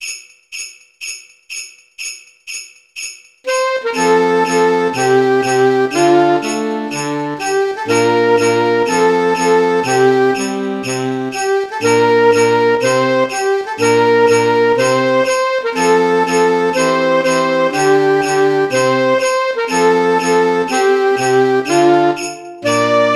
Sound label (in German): F-Dur Sax; Saxophone